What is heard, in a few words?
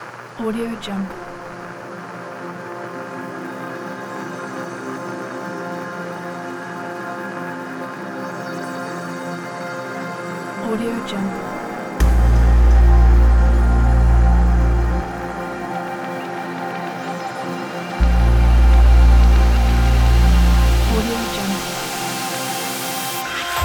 deep dramatic ambient; digilukz